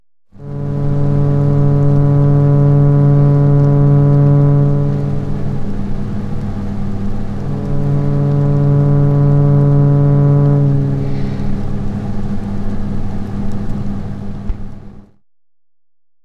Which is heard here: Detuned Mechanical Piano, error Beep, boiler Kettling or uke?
boiler Kettling